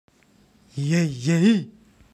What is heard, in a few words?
yay yayy